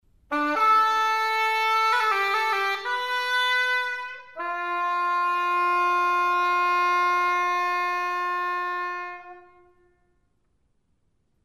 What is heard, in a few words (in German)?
Schalmei Klang